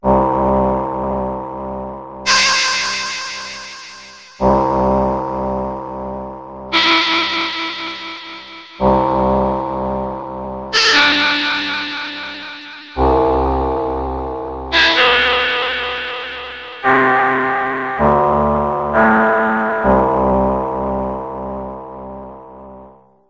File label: synth granular, yamaha tx81Z